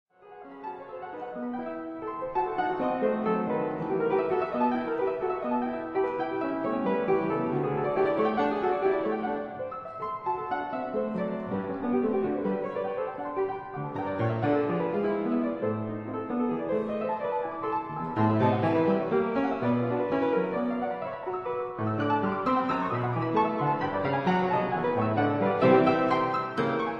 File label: Piano Sonata in D major Finale Allegro vivace